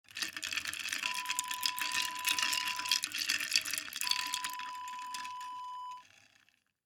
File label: ice cube stir